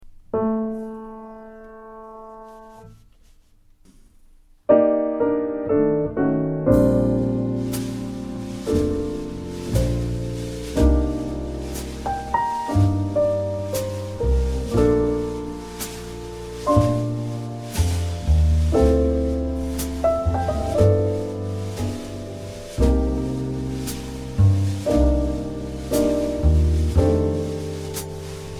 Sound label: minus vocal